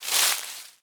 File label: Leaf litter step; Ściółka